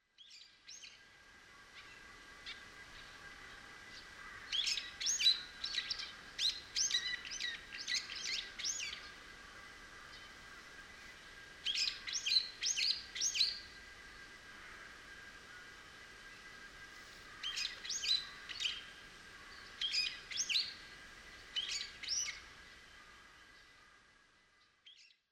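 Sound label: Brown-eared Bulbul; hiyodori; sapporo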